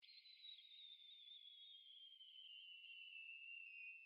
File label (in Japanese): 花火のひゅるひゅる音; firework